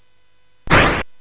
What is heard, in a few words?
Dark Castle, CSG8580R5